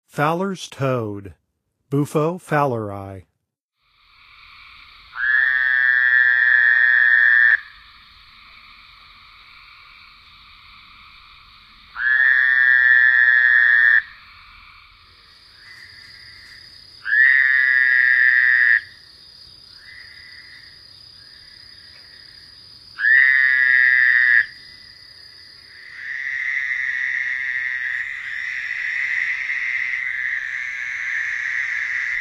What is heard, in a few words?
Fowlers Toad; Nature Songs; N. America; Songs of Frogs & Toads of North America